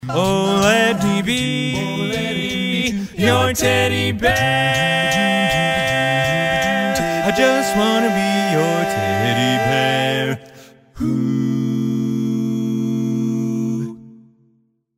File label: barbershop tags, all parts